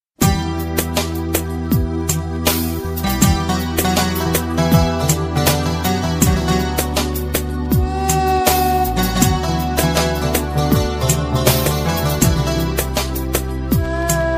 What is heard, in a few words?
Armenian